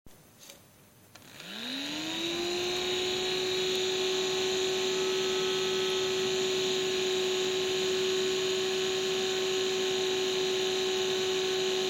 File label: old fan